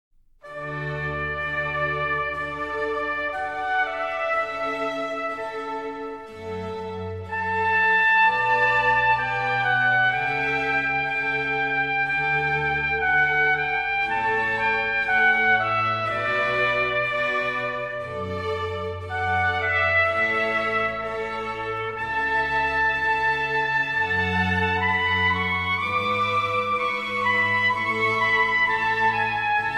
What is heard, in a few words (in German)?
Adagio aus dem Trompetenkonzert D-Dur